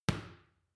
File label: boxing paw hit medium; sport freizeit; boxen